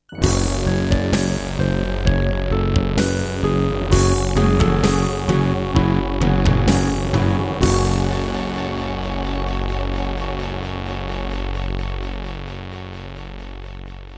Terratec MiniWaveSystem TTWT; Doom (Sigil) - Introduction

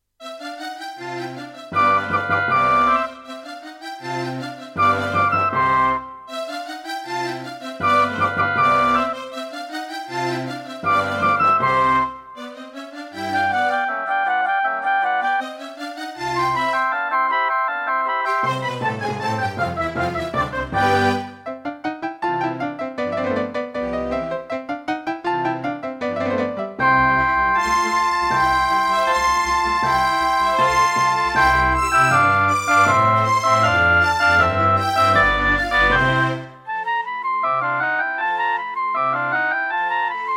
Roland PMA-5; classical; Mozart; Concerto K467 partial

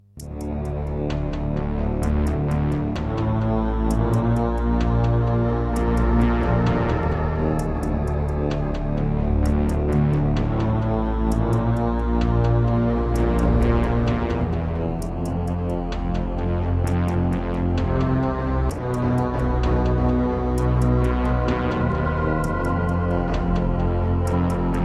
Diamond Monster Sound; 2MB; Descent II

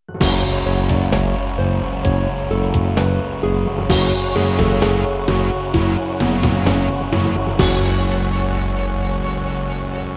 Introduction, Roland SD-90 GS MODE; Doom (Sigil)

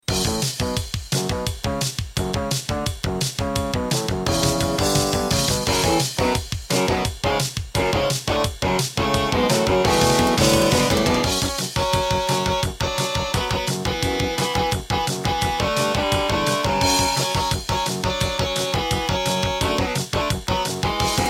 Intro, Pine PT-2030 Vivaldi Xtra Wavetable DB; Duke Nukem 3D

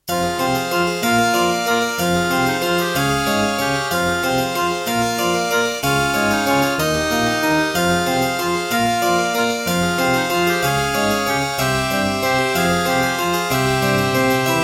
Alesis NanoSynth; Death Gate - Track 6

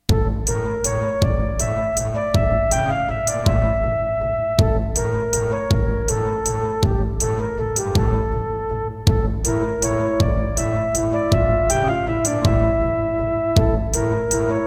Serdaco Dreamblaster S1

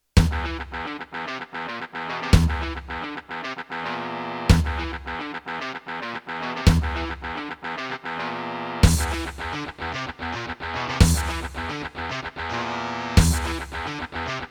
Serdaco Dreamblaster X16GS NATIVE; Doom

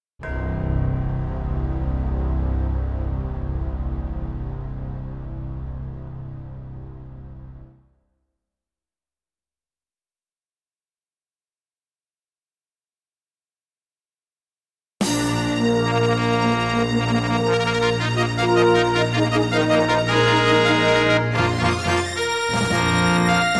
Intro, Yamaha MU500 GS MODE; Dark Forces

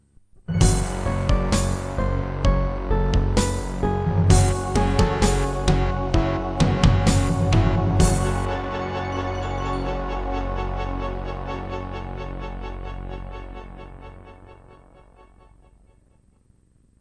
Introduction, Serdaco E-Wave; Doom (Sigil)

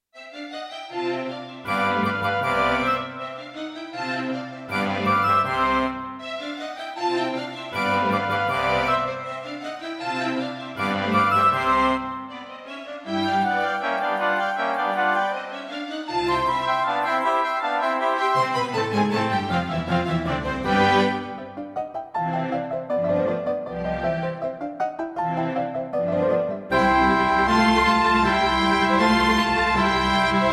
Roland JV-1010; classical; Mozart; Concerto K467 partial

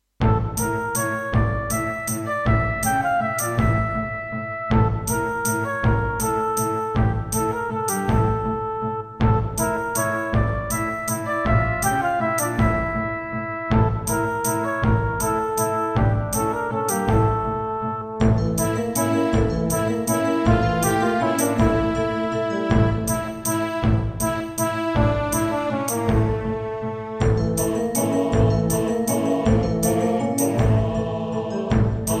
Roland SC-88 Pro 55MAP

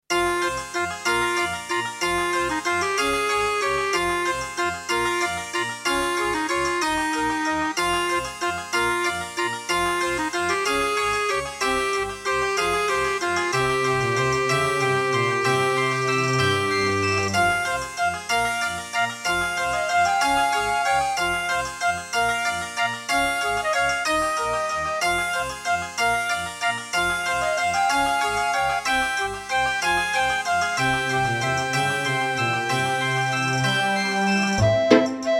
Track 6, Creative SB AWE32; Death Gate

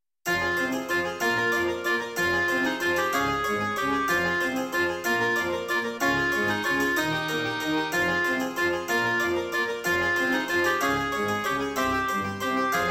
Track 6, Roland SD-90 GM2 MODE; Death Gate

